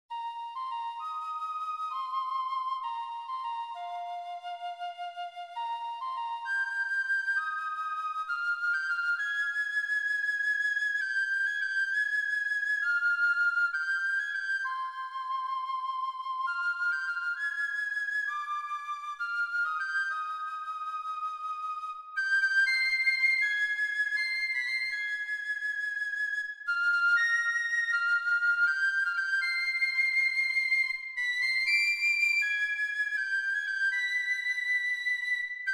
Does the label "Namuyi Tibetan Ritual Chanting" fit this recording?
No